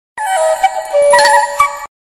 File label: Jungle Fun